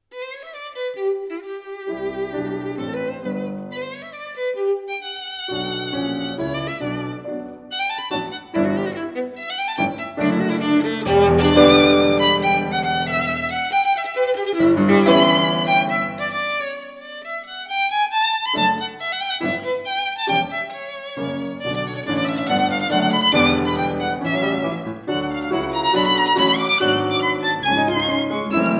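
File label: violin sonata; sjogren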